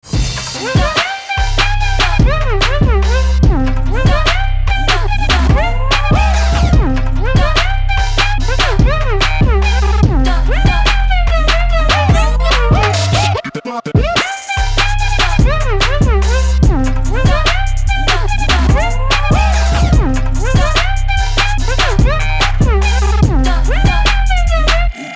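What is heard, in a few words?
cheerful trap, great sound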